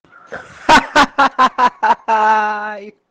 haha

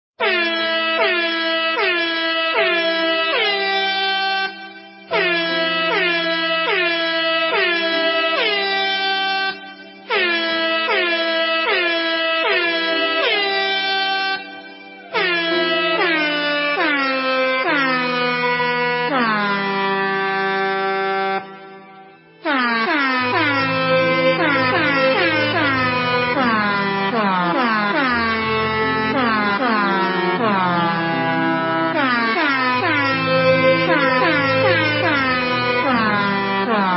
air horn sad violin

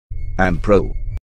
sound effect

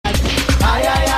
funk; animatunes

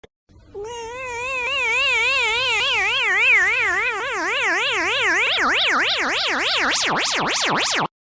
distorted cat meow

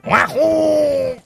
wahoe

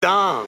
dong

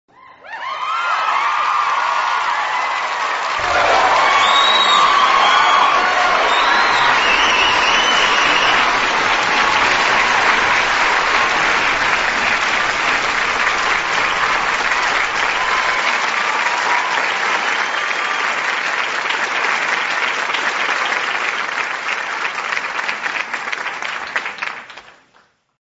clapping and whistles

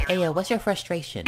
frustrationnn

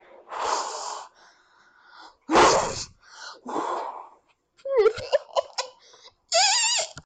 blows hahah

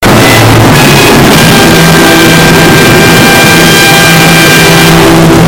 dramatic loud

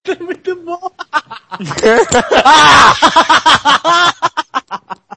hahahaha

